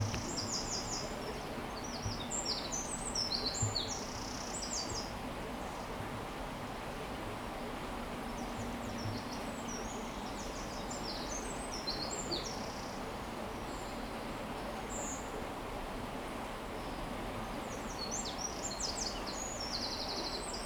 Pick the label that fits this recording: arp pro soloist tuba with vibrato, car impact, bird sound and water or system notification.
bird sound and water